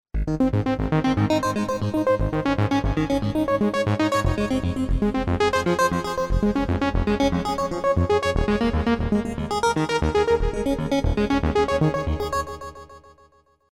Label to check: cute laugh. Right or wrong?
Wrong